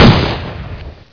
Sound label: m249 shoot